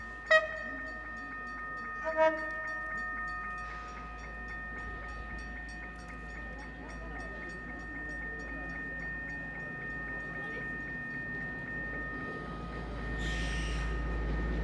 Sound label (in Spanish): estacion rosario sur